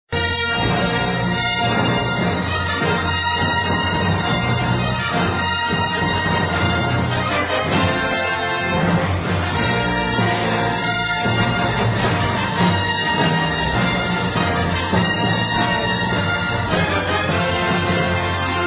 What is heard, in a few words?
english movie theme song tone ringtone